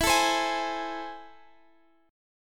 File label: A Minor Major 7th, E